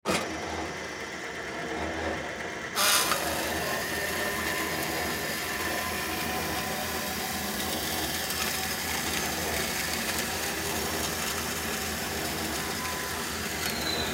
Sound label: Grinder Machine Metallic Scrapes; Grinders; Machines; Industry